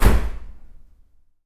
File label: Opel Corsa Door Slam In Garage